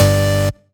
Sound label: Synth Cuts; Vengeance Samples; VES1 Instruments